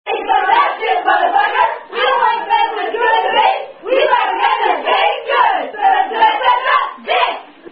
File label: Female Variant; Head Who Said Head